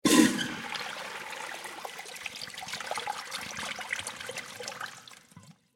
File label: Water Toilet Flush Public Stall; Bathroom (Sound Effects:Household)